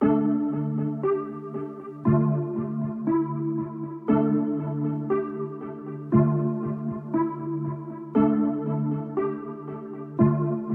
118 BPM D Min Chords; Cymatics; Ares

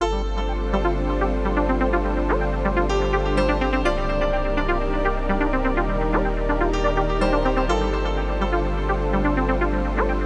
125 BPM G Min, Melodies, Sunset; Cymatics; GHOST (Beta Pack); Acid Dream

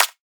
Dubstep Clap; Claps; Ghosthack; Dubstep Sound Arsenal

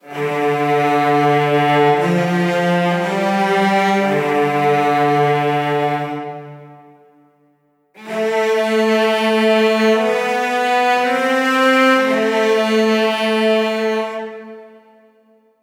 Solo Cello Melody - Am - 120BPM; Construction Kits; STEMS; Ghosthack; SkyRealm; Kit 21 - Mystical Journey

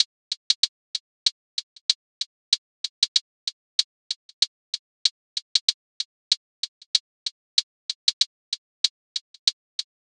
Leather Hihat Loop 95 BPM; Cymatics; ANALOG EVOLUTION Beta

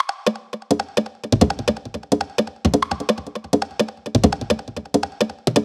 Perc Loop, 170; Drums; Percussions; Loops; Confound; Ghosthack; Drum Mega Bundle; Abstract Percussions Volume 4

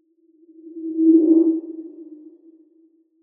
Haunt, Whooshes

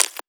Percussion, Hip Hop, Drum One Shots

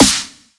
Snare, Dubstep One-Shots Drums

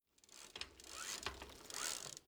Bike Chain; Fairlight Sound Lib

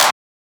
Clap, Techno; One Shots; GhostHack; Ghosthack - Free Techno Sounds 2024

